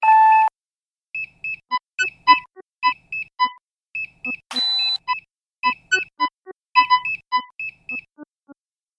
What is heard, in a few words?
Bing Bong Cutesy short sample